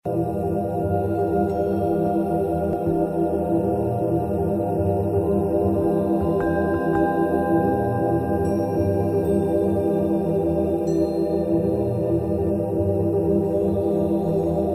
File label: bowl